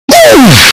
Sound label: oof; mario; torchlight